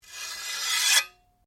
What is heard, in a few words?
Blade Lock Scrape, Sword; Foley sounds for melee weapons; Swords; Combat